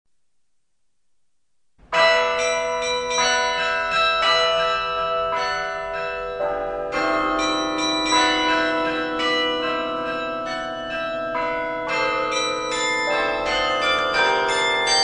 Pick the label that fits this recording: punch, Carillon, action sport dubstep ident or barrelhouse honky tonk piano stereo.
Carillon